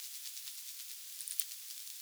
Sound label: Myotis mystacinus, batcalls, europe